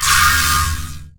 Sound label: Scifi Forcefield Activate; Energy & Forcefields